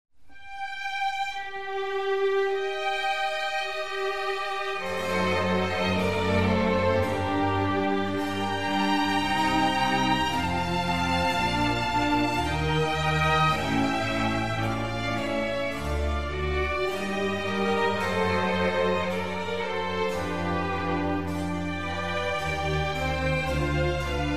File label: Concerto for Strings in G minor; RV155; Antonio Vivaldi